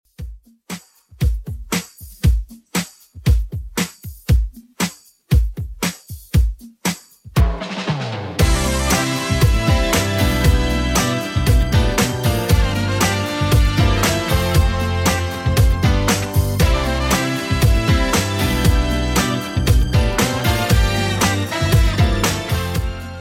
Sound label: 90s 117bpm; Redrum